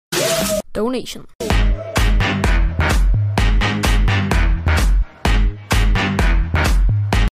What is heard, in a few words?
twitch donation sound